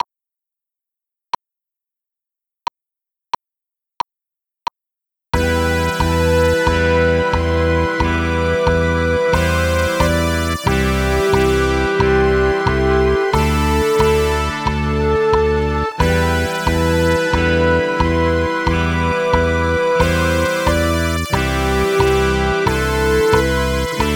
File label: Keyboard